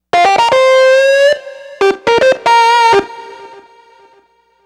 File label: Grunge Lead; Riffs & Solos; Dr. Fink's Funk Factory